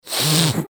sniff

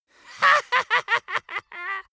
dwarf laugh gleeful

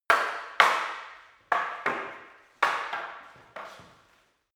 staff wood fighting sequence

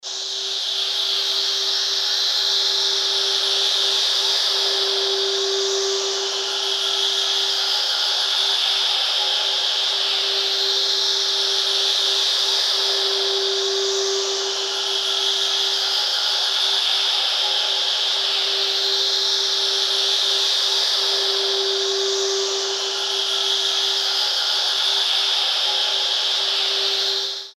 machine flow noise

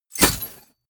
chainmail impact

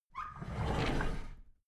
drawer big squeak open regular

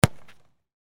football kick

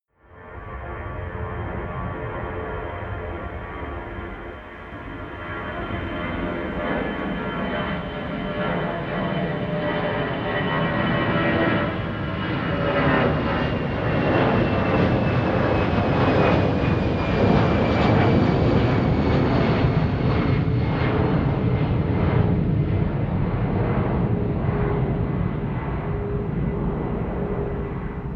propeller plane flyby land tonal

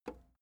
plastic button small simple release

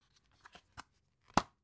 dvd case open

